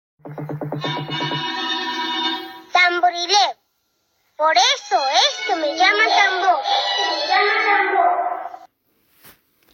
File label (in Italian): Tamborile